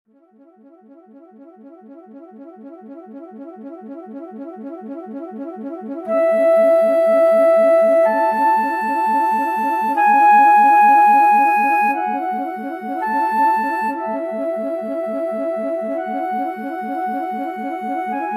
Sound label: flutter alert